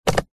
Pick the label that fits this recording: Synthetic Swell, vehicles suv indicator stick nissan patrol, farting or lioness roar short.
vehicles suv indicator stick nissan patrol